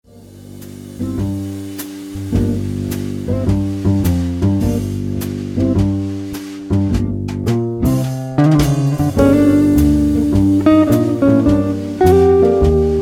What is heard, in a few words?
siciliano